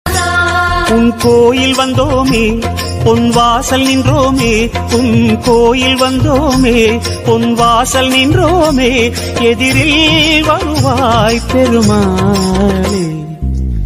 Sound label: devodional ringtone tamil ringtones; lord vishnu; tamil whatsapp status